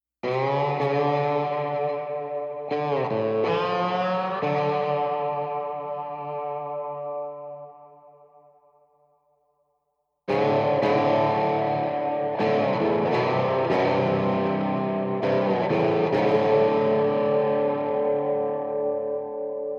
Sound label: d minor guitar only